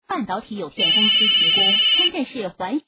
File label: Ring back tone